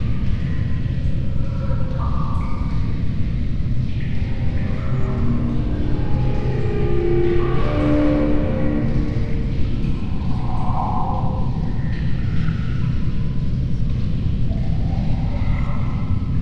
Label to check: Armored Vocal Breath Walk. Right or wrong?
Wrong